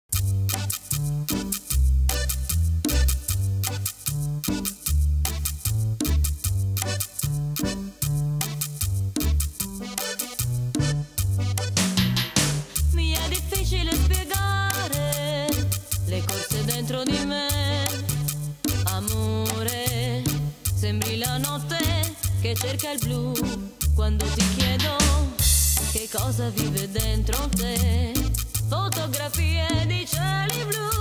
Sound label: cumbia